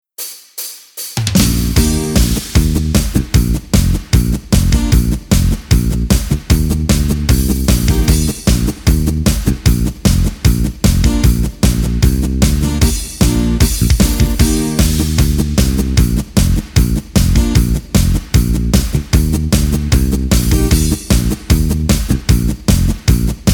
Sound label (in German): Polka BES AS DES ohne Harmonika Begleitung